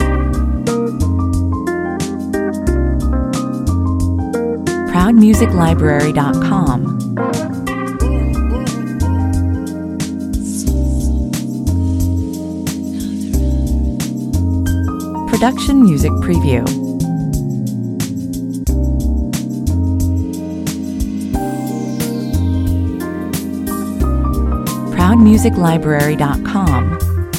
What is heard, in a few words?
cocktail lounge (on hold) loops 90bpm